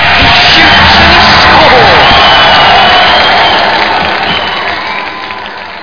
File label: GOAL; quake; arena